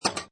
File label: Pinball sound, metal clank with return